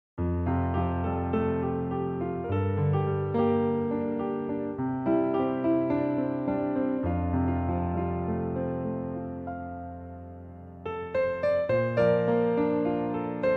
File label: Piano Slow